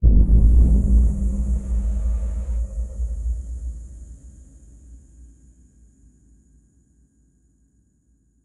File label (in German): auswahl, menu